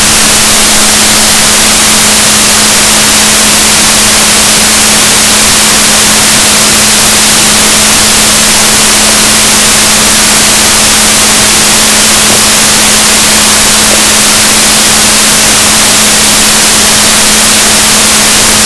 rorquals negatif, gaussian noise, Balaenoptera Physalus Chile